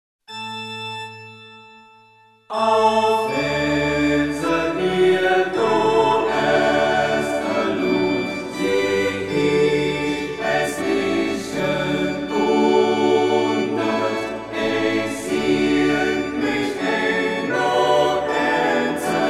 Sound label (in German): Chor